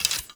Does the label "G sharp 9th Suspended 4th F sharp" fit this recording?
No